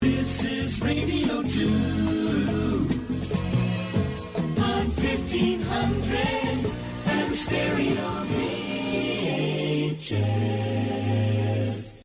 Radio On Stereo VHF, Radio style ads intros sweeps & jingles